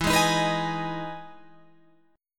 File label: E Major 7th E down Guitar Open F; EM7 strummed